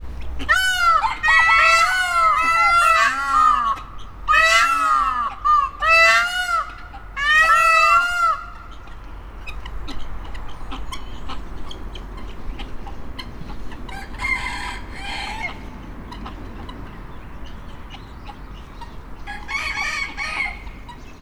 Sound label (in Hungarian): pávakakas gyöngytyúk és kakas; állathangok; nyíregyházi zoo 2010